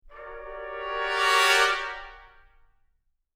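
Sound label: trumpet crescendo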